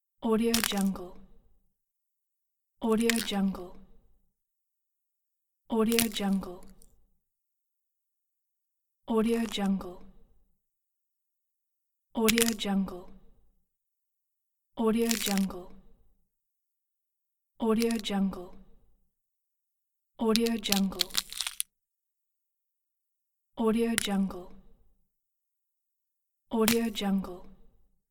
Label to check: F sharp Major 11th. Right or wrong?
Wrong